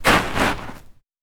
Snow

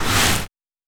Sand gravel scrape

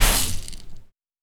Sand gravel

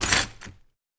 Glass

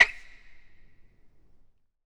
Basketball Court

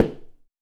High Heel

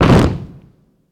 Soldiers

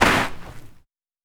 Snow

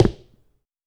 Dress shoe

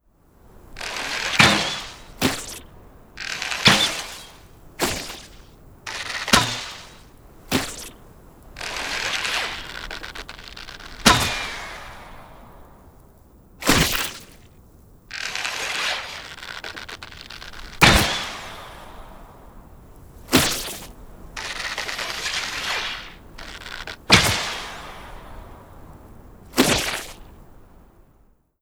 Bow; Weapon Master

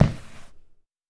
Sneaker scrape

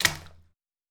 Puddle

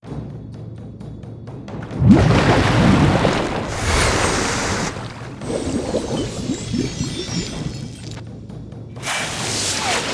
Magic Spell Water; Magic Spell Master

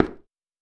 Cement

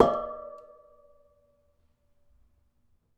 Metal

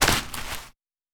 Forest

